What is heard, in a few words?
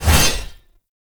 Blade Swipe; melee; weapons; Warframe; Dagath Blade And Whip